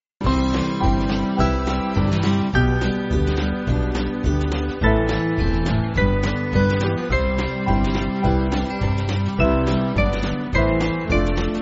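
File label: Band, Backing Files for Churches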